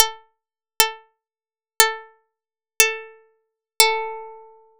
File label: str synth test